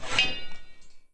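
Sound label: crowbar holster, weapons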